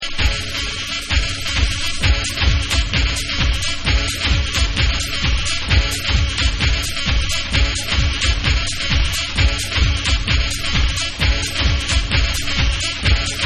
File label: Bonus Horns